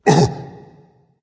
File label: surprise dragon; fafnir